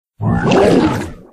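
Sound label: bigslime attack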